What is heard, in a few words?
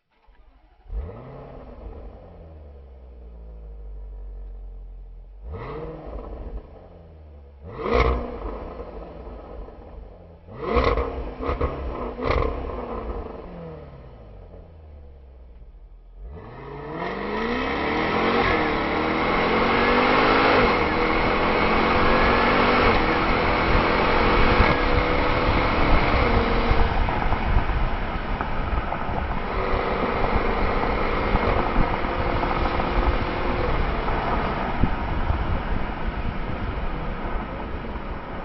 Jaguar XFR S